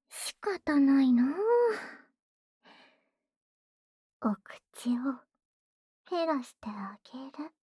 傲嬌 黏膩吹嘴

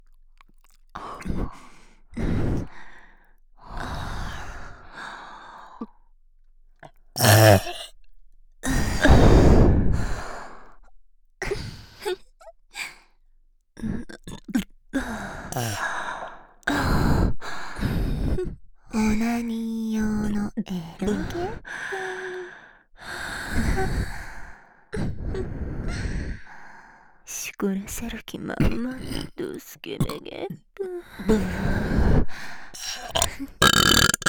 打嗝 總集篇

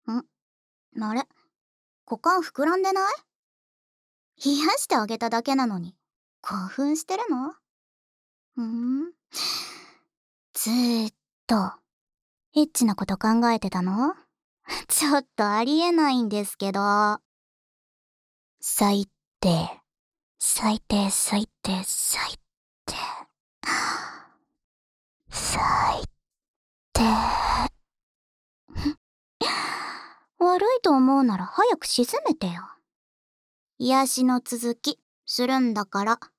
舔耳同时协助自慰（右耳）, ASMR

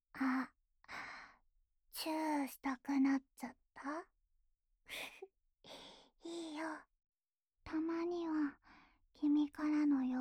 普通的接吻, ASMR; 卿卿我我用

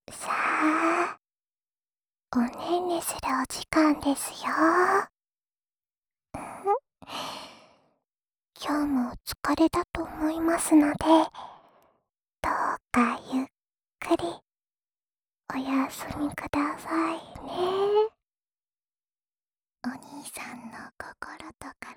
安眠用陪睡循环音轨 位置反转; 栉形与爱宕

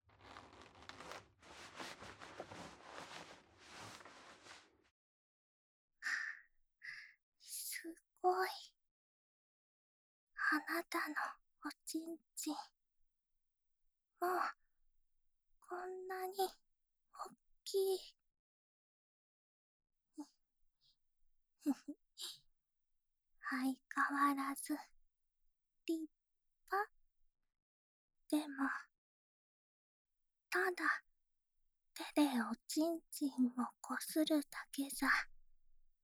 舔耳和手冲（右耳）; 露琪娅的舔耳和手冲（右耳）

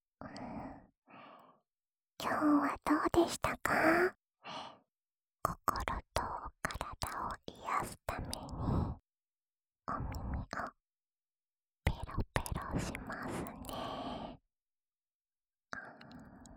浅浅的舔耳（声道翻转）

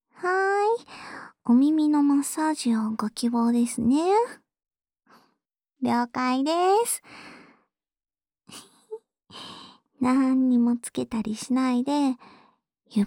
裸膚按摩 左

